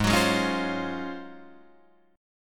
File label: Ab-13th-Ab Guitar Drop D, Ab13 chord; strummed; JGuitar